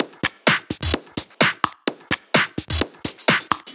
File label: Drumloops 128BPM